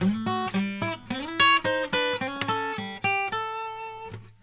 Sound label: Blues Guitar; ILIO; Fretworks